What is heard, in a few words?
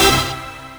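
HEIDI CHORD, RAVIN HITS; Spectrasonic Distorted Reality 1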